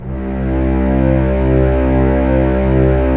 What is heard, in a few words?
STR, STRING; Roland - LA Composer vol. 1